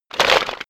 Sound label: stone gravel clatter